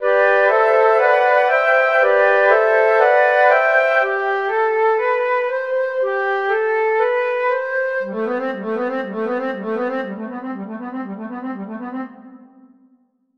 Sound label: Flute lin Rev, fades